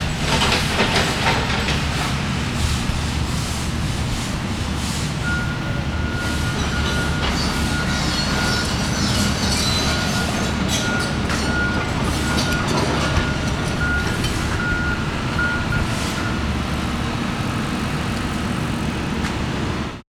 Downtown Houston Criminal Justice Center Construction Beep Beep, ambient